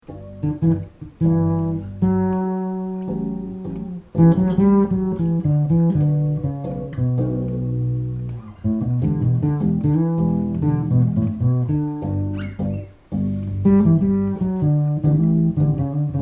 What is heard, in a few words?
bridge neck, bass; dialog